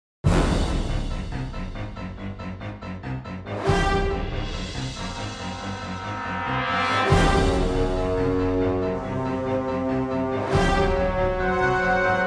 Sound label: strident action, music; pbskids; go studio